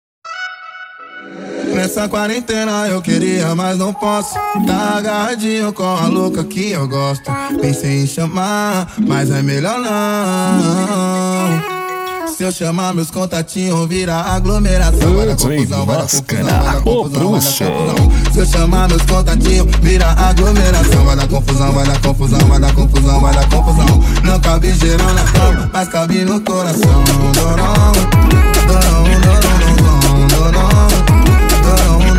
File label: cd funk; funk hits verao 14